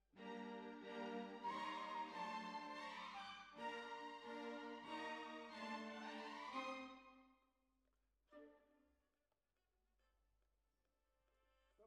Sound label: Bassoon, Jazz for piano